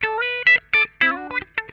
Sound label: LICK, Funk Guitar; 90 s Sample CDs; Zero G